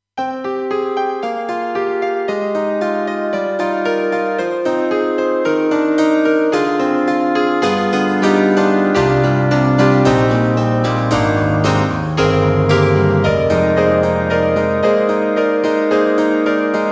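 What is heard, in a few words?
Electric Grand; KAWAI; GMega; ElGrand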